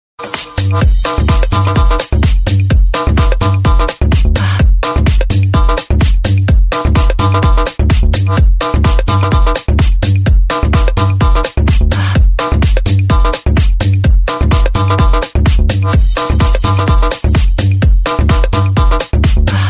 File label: Shake Ringtone